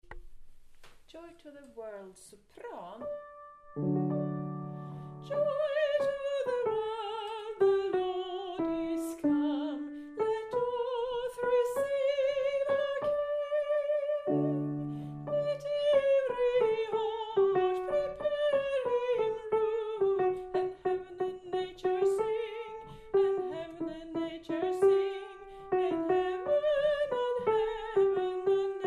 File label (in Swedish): sopran